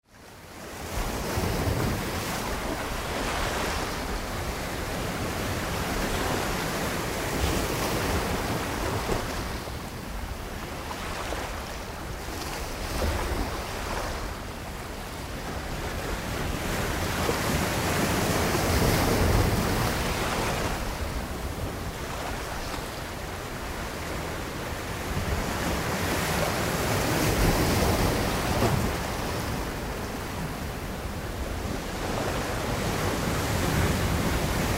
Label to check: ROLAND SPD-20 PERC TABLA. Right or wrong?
Wrong